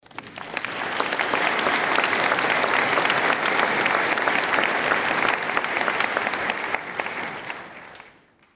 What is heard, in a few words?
Applause